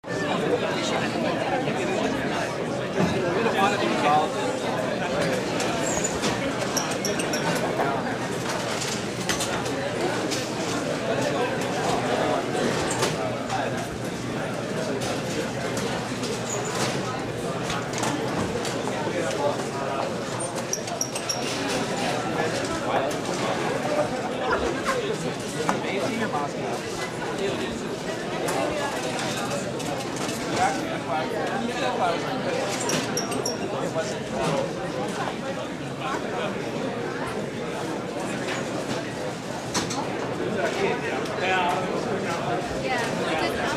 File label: caf, ambience sounds